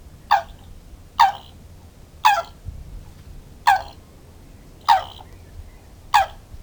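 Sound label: red-crowned crane voice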